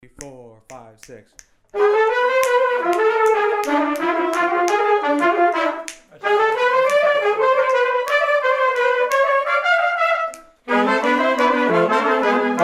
melody A fast, rehearsal

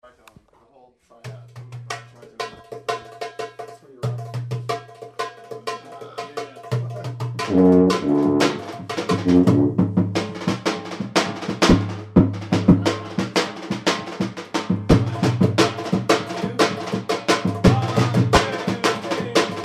pool party rhythm sectional rehearsal

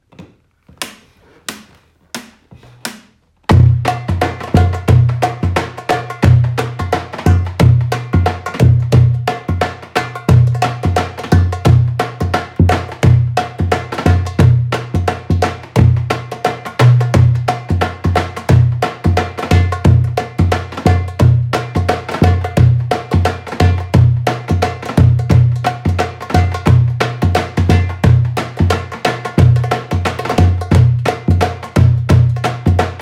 Indian Drums 88

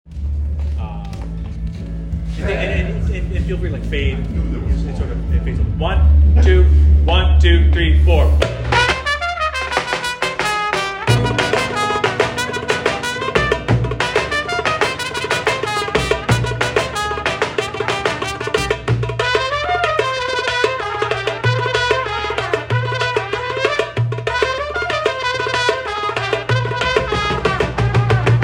drum thing

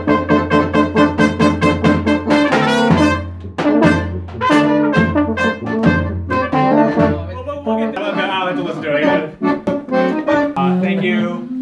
Solo Mids

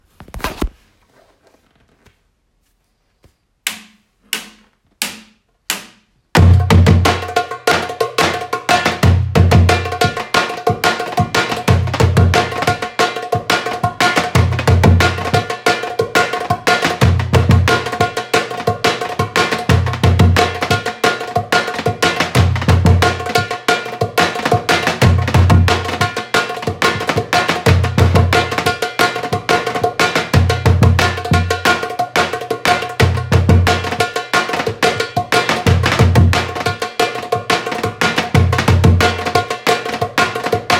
beat 90, Drum samples

